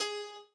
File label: piano